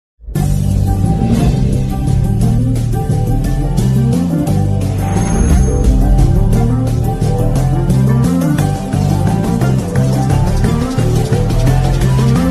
bullet riding ringtone; thiruchitrambalam; dhanush

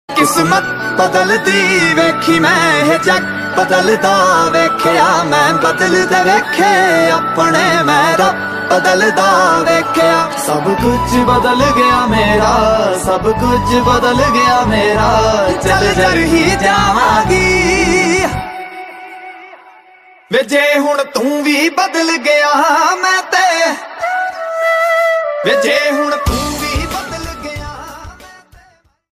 heart touching ringtone

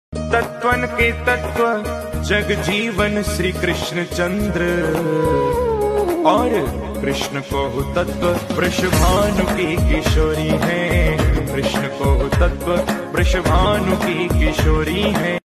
love ringtone; love ringtone viral trending

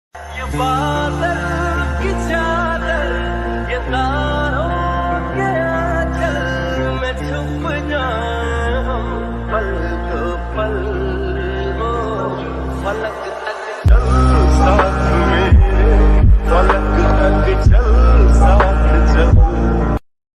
bike bgm lofi; bike lover

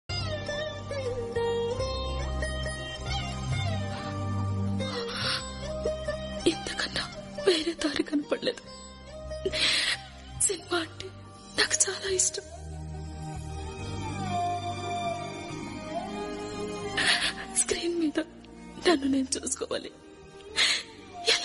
emotional bgm; khadgam; raviteja; sangeetha

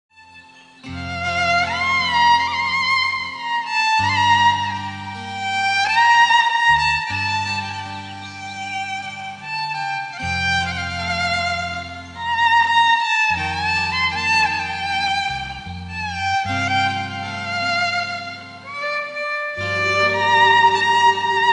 violin; mohabbatein

